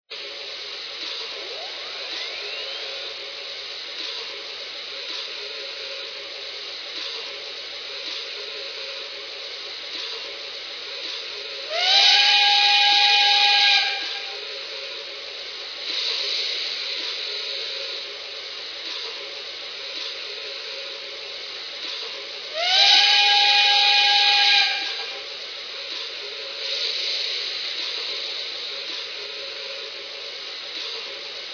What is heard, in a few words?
H10, trains